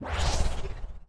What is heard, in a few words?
Boost